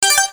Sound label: Ding; gator panic